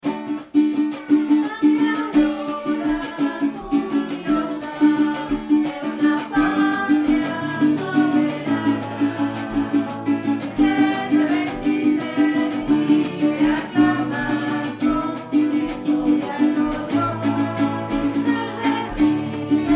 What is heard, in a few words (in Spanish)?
himno a la virgen de coromoto